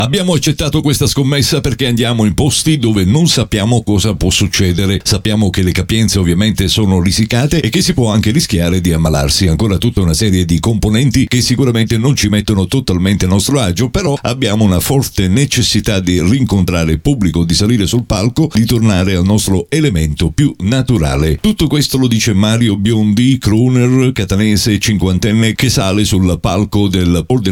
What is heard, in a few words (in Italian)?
Musica